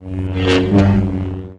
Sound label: wave around, lightsaber sfx